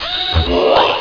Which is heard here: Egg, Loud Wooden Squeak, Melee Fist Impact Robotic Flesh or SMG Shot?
Egg